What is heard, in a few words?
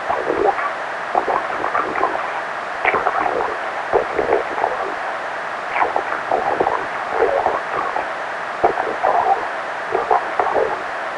combine radio idle; soldier common